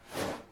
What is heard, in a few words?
miss